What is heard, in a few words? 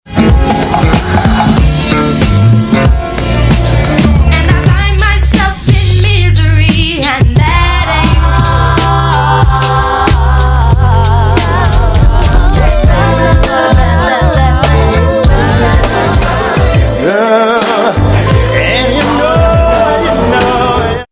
90s old school sample songs